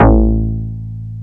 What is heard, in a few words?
XFM BS, FM Syn Bass, Synth Bass